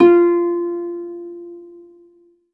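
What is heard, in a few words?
HARP G4 SUS; ILIO; Synclavier Strings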